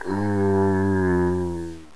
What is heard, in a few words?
zombie idle